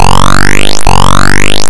low fuel, The pitch warbles by decrementing the period each frame, creating an urgent rising tone that resets after reaching zero.; below the warning threshold; River Raid